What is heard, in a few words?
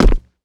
land rubber, footsteps; player